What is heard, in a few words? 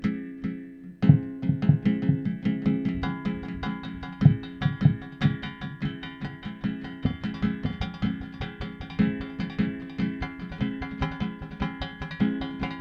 Brushes